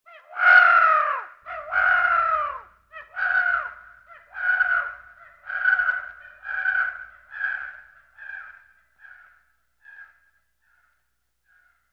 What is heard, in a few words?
Crow Caws; birds